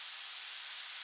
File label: Sand Loop; Playable Tutorial Level